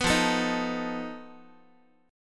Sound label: F 7th Flat 5th F down Guitar; F7b5; strummed; 14,13,12,13,x,x